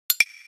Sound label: camera shutter; Samsung Galaxy Pocket